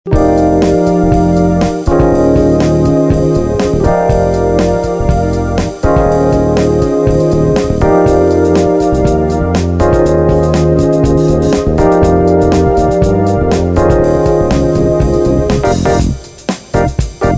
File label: fusion jazz